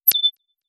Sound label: pin